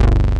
BASS, Synthsonic; Pro-Rec - Synthsonic; BASSES

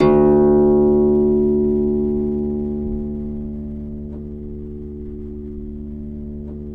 CEL HARP D1; USB Soundscan vol.23; Historical Instruments; AKAI

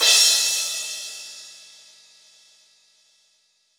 Rock Crash, cymbals; Roland BK-5

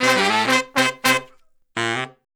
JAZZ

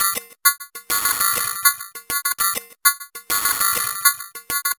Residual Beat; Sonic Foundry (Sony Creative Software); Loop Noir

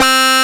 SYNC WAH, Jupiter Synths; E-MU Formula 4000 Series Vol. 3 – Analog Odyssey

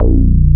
MM BASS, Bass Guitar; AKAI S6000 CD-ROM - Volume 1

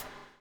BUBL, BUBBLE; Spectrasonics - Backbeat

